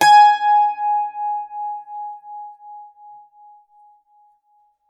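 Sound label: bouzouki G#5; SoundBytes; Early Patches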